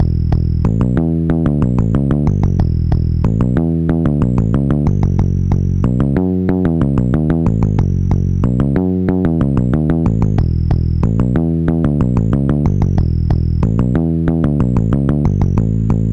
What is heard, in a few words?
OctaMED; tube